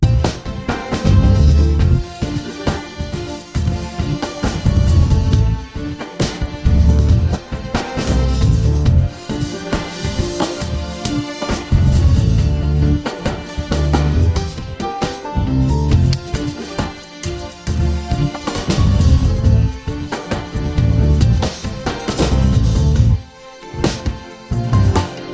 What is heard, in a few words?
drum kit bass strings drum kit